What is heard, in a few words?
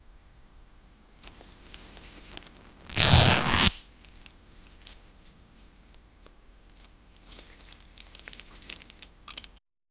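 leather jeans belt bursting, clothing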